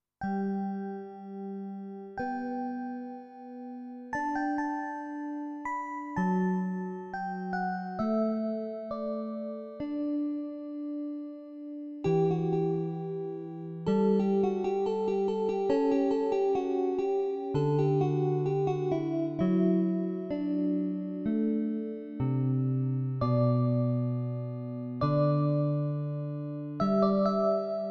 DX Koto EP

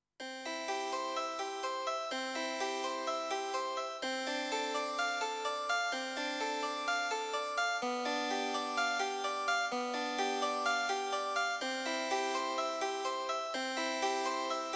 Harpsichord; XG

